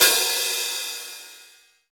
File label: Pop Open HiHat